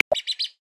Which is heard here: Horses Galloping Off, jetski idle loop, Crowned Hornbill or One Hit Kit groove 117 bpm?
Crowned Hornbill